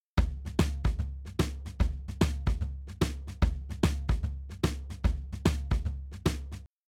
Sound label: Drums, shuffle drums; WDR2